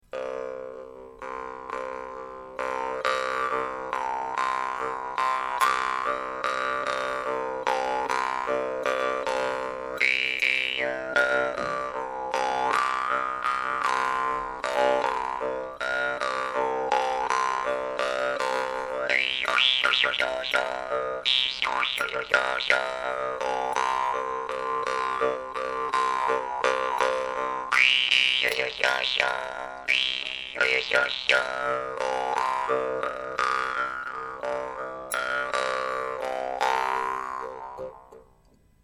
vargan kapla; ustinov

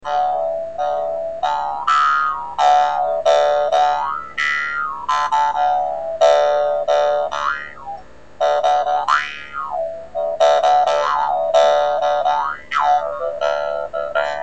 dan moi glass; vargan

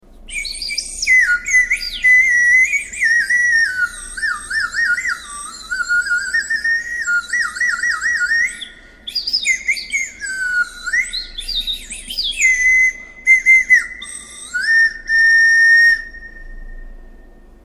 lotus flute; roserwood small

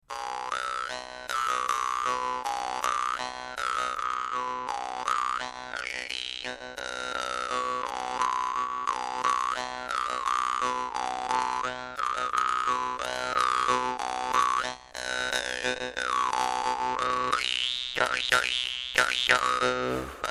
tatar soft, vargan

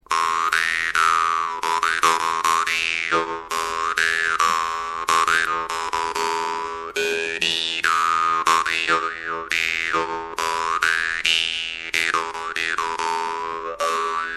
parmupilli E, parmupill middle; vargan

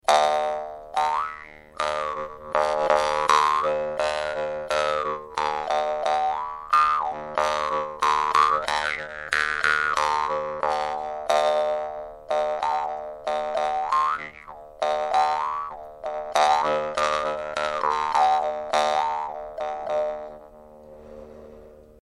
drymba; ukraine drymba wood; vargan

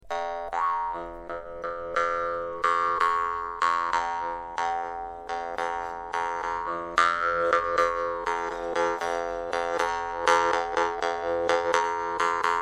kubyz bash concert; vargan; concertn

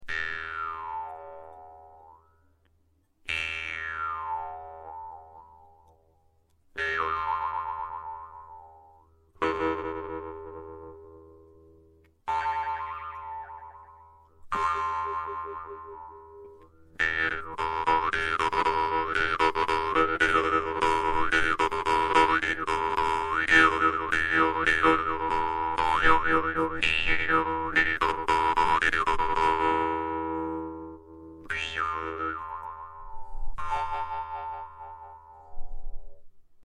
khomus; khomus ellacin; vargan

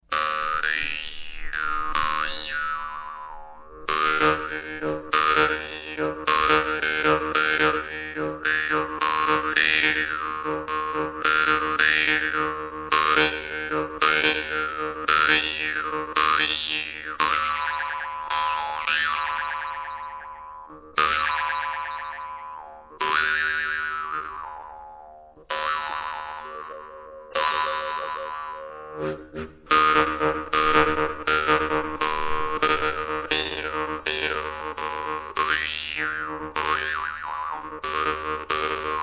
yakut khomus; vargan

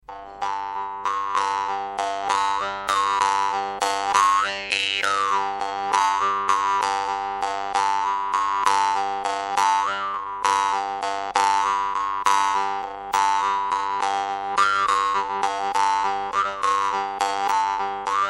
tatar melodic, tatarski kubyz melodic; vargan

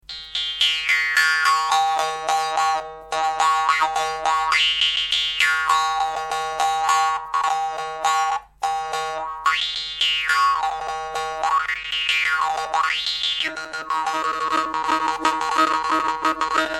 yakut khomus small; xristoforov; vargan

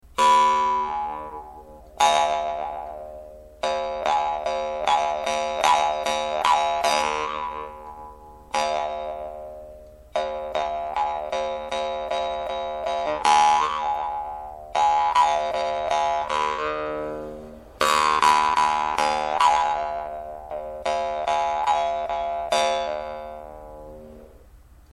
yakut khomus; vargan

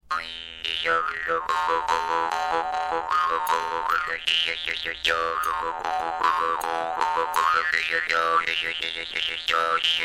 vargan; doromb sardini

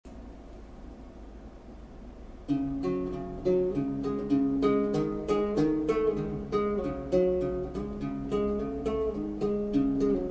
topshuur, string

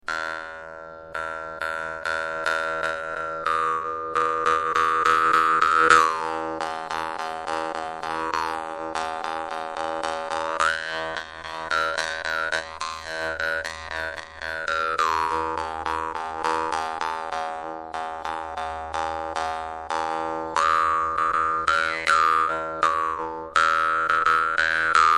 khomus; yakut khomus komissarov; vargan

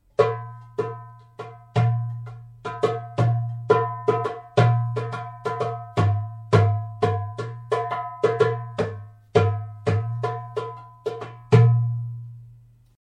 dumbek; durbuk

